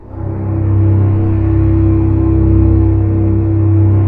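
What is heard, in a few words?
strings C0 PP; Sound On Sound - Peter Siedleczek's Orchestra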